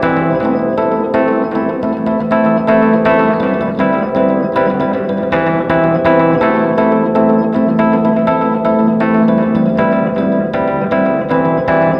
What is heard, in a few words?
keys wet, with airspace, hybrid reverb and delay; modeaudio